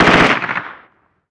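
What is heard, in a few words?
gun mp5 medium; Call of Duty Modern Warfare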